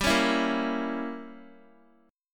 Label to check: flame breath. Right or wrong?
Wrong